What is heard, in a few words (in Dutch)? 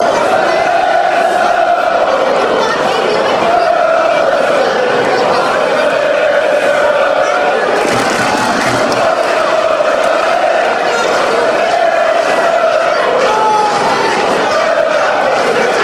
wij zijn lierse boeren, fanchants